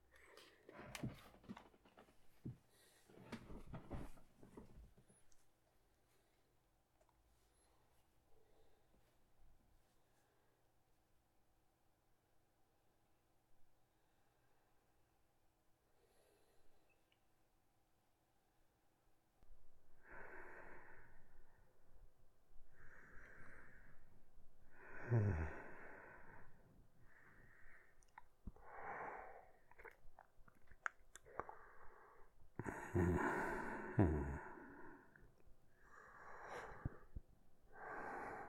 Furtive Noises

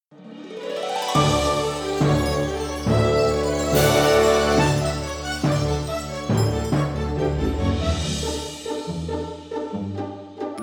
Instrumental Cover

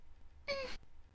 Breath; Lewd SFX

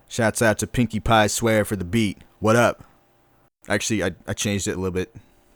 Outro Verse, Vocal Stems, 120BPM; Pony Fan Music Remix Stems

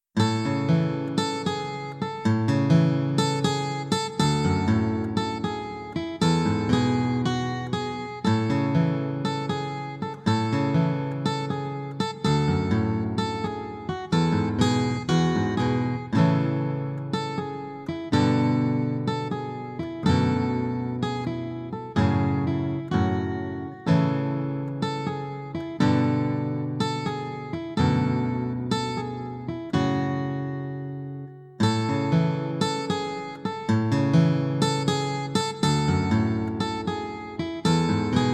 improv.

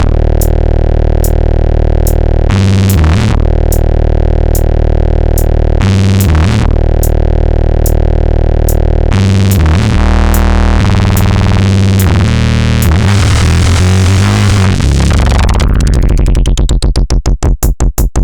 BROKEN KICK AUTOMATION

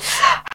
Grunt; Lewd SFX

